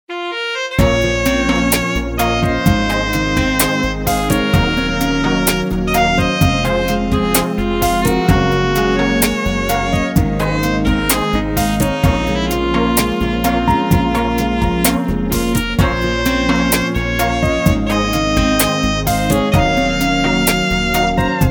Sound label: Sweet saxophone